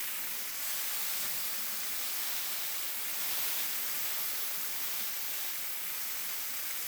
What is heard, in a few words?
washing foamgun; ship